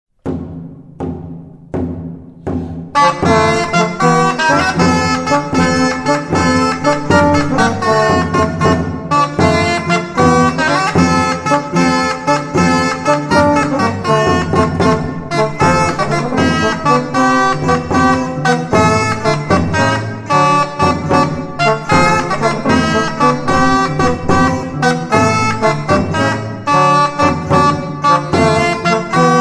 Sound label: rauschpfeife, Shawm; nachtanz; folk music